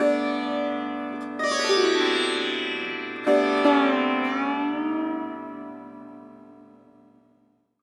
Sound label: d maj sitar chord